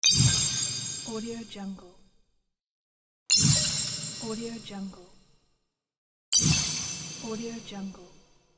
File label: small spell; game sounds; طلسم شدن